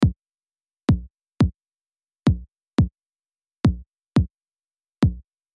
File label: Beat